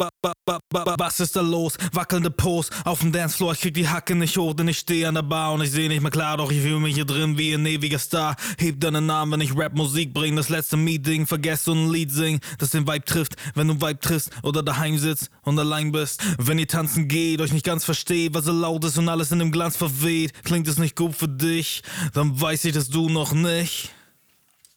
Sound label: waves